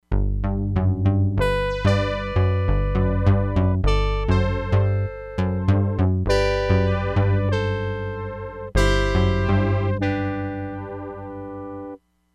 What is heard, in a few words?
PHASED GUITAR - BRASS, Crumar Bit 99; Factory Programs